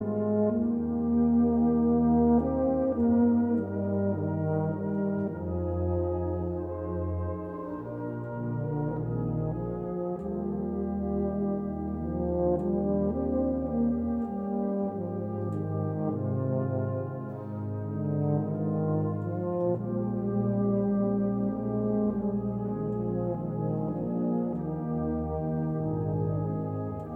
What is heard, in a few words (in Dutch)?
Drumfanfare